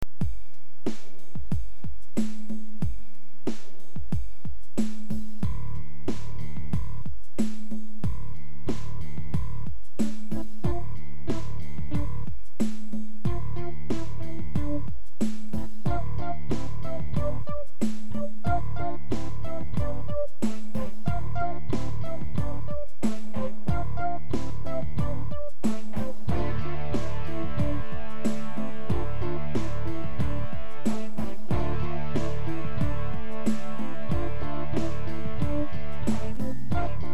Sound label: Yamaha ditty